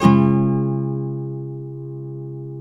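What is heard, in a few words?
nylon guitar up major D, flam chords; Spectrasonics; Hans Zimmer Guitars VOL-2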